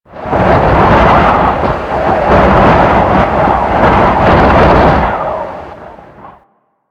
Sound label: Mountain Pass In Ear Wind Gust; Ambience; Warframe; The War Within